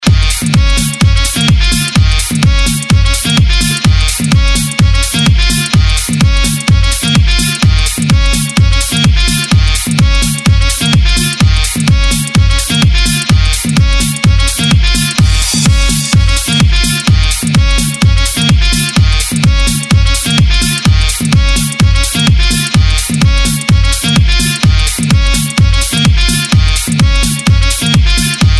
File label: Music Build Dance; Club